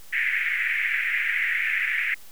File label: fc=2kHz; 音の高さ; 音色的高さ